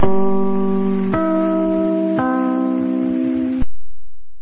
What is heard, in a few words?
nbc chimes